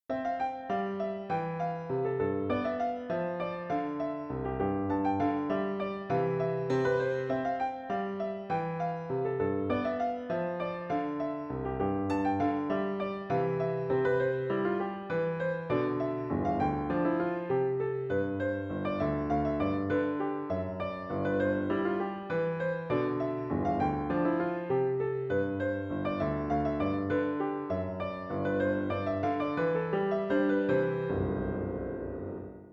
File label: Four Sententiae for Piano